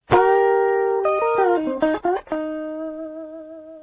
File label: lick